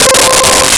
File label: Polysynth C5; Nintendo; July 2020 Gigaleak